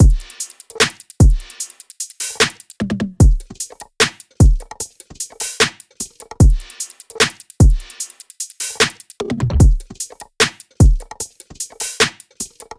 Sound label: loop full 150; Seismic Loops